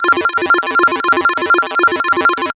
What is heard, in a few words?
lead chipper F; leads